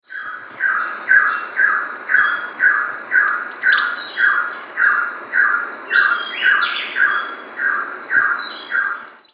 Bird Sound

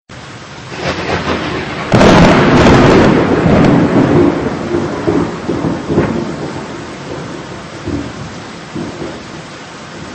Storm

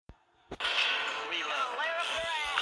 Gunslinger Reload

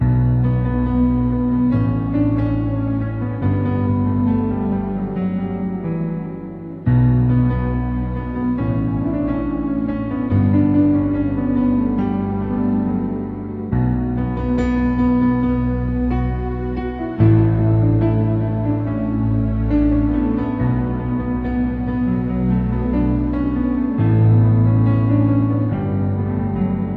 Piano

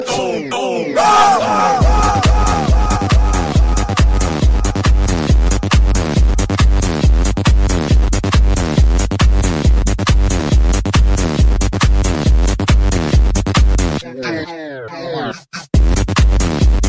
Huge Bass

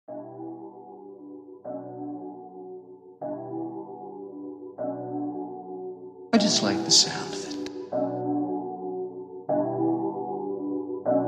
beat

